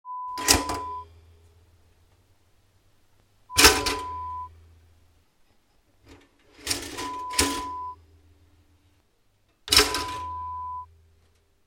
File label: Toaster sound